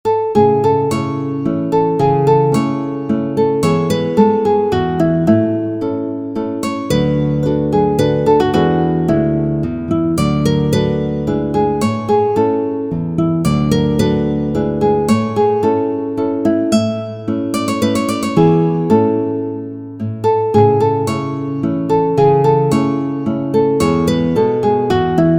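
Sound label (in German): Frühlingslied, Gitarre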